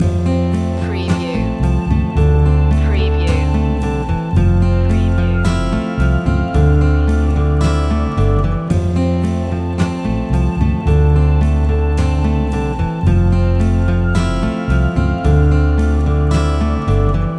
acoustic ocean loop

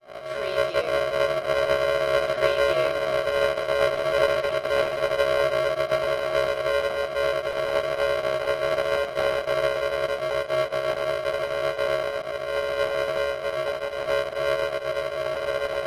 Distorted Space Signals

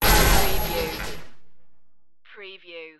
starship door access

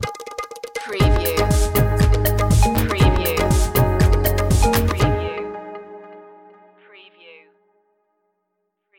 bollywood stinger

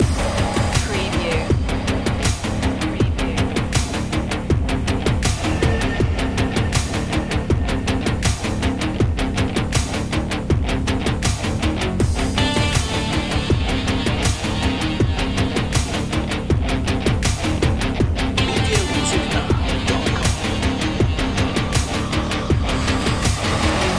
cyborg dance short loop

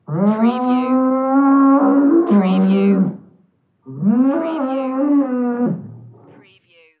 Bull Bellows

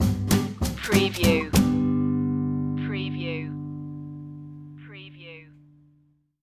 catfishin stinger

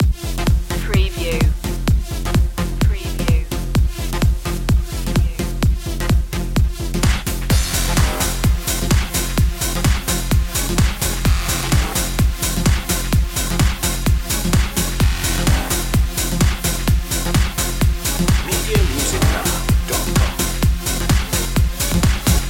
turn up the music loop

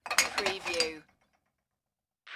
Dishwasher Remove

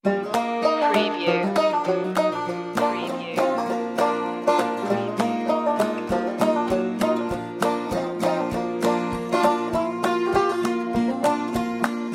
barnwood banjo

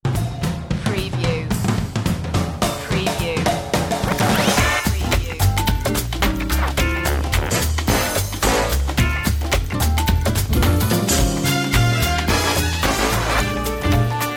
crazy sale commercial

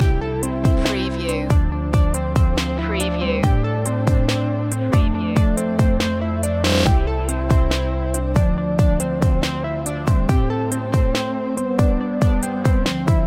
robot love loop

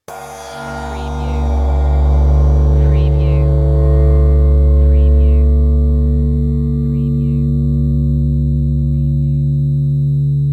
Cartoon Drum Cymbal Weird

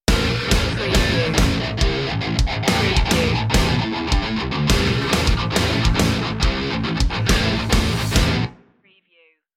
melee master stinger

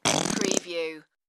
Fart

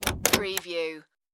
room door open

